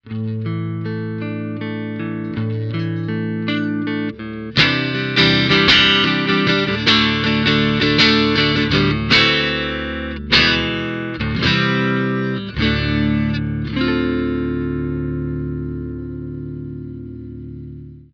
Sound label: clean direct out; kammer tiny k test